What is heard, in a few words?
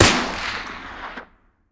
fire dist; gmod; arccw uc commons attachments